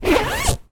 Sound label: household cushion zip; zapsplat